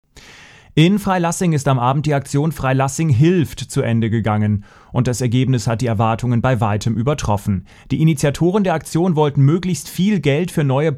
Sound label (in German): Mitschnitt Brunnen